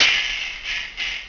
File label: splash; Charlie; Game Examples